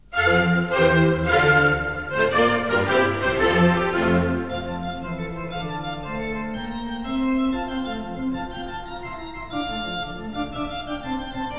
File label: Handel Organ Concerto No5 Op4-5